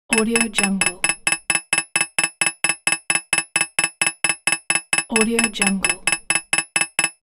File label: constant timer